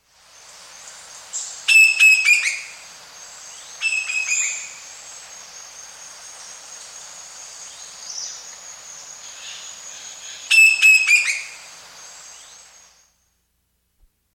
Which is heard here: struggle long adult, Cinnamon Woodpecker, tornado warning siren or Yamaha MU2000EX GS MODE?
Cinnamon Woodpecker